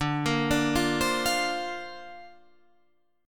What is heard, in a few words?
D-Minor 7th Sharp 5th D Guitar Standard, chord; Dm7#5; arpeggiated